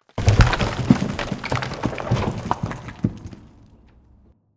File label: Assembly Plate Lift Impact Rock Debris; Boomtown; Titanfall 2